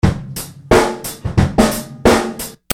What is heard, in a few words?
Break